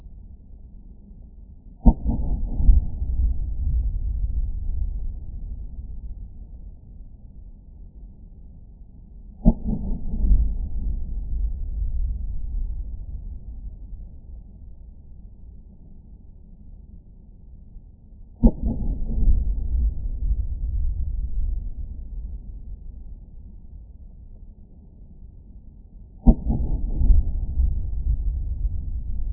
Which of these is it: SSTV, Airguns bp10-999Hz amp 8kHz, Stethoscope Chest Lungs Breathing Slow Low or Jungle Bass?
Airguns bp10-999Hz amp 8kHz